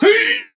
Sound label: Grunt Hit; BombKing; Paladins